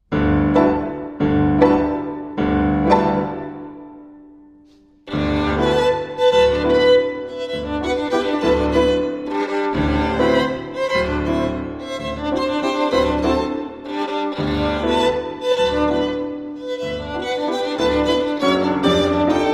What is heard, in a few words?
Suite For Violin and Piano, Waltz. Alla Valse, Vivace e Rubato